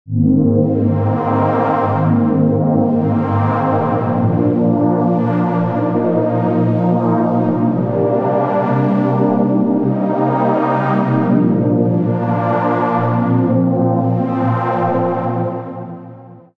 pad

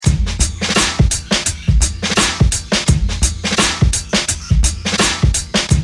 Space Drone all

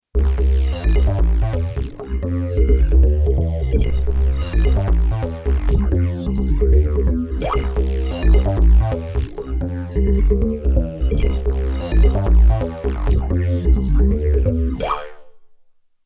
bass with fx

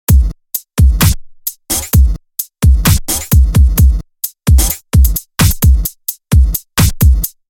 drum

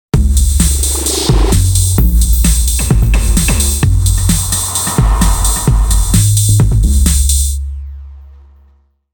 drum with fx

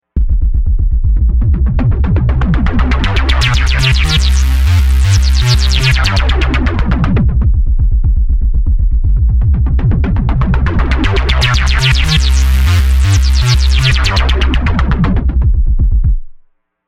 bass

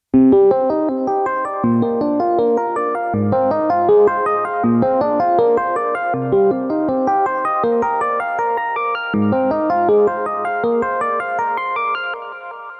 arp

